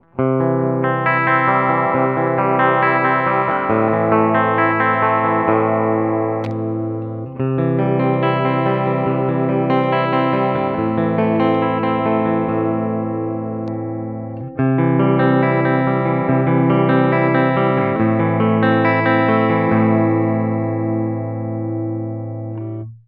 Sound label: direct